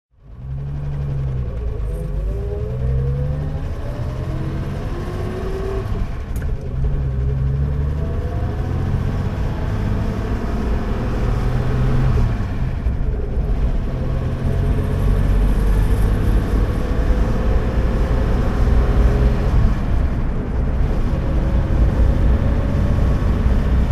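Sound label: jaguar Mk V 35 saloon drive, Engine sound driving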